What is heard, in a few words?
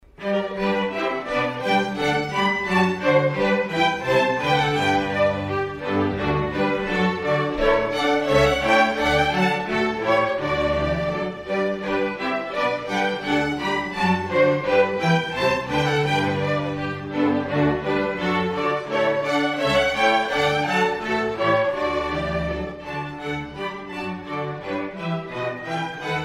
Watermusic - Bouree